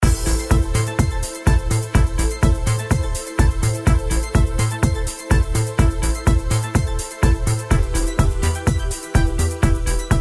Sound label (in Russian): muzyka dlya igr